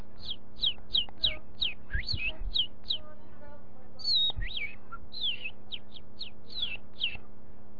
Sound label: Pizzaria (ambiance)